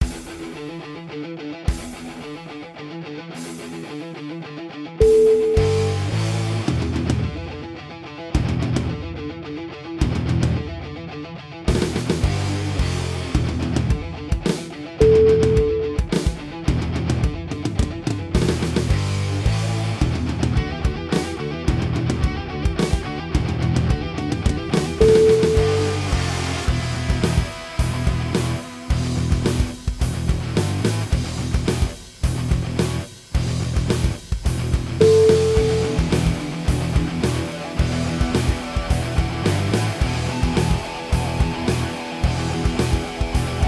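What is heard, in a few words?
exciting hard rock, music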